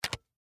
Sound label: Thermos Open, Foley, Thermos, Flip, Top, Open; Lid Sound Effects; Lids (Sound Effects:Objects); BlastWave